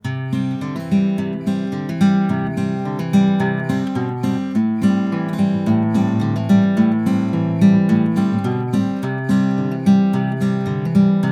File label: U87 Guitar